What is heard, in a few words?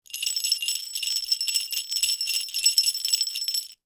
jingle bell